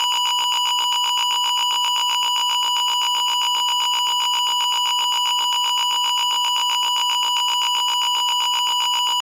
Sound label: count down; SuperMario